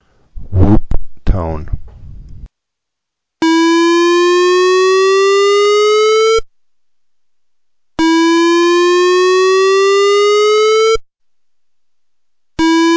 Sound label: Police Whelen Whoop